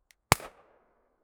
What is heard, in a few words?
a single shot of an, ak47